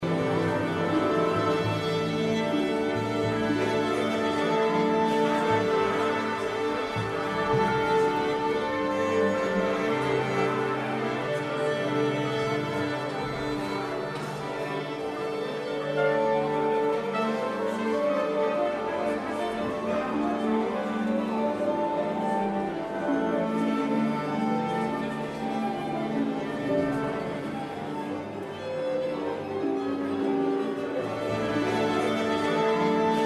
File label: Orchestra Warm Up Sound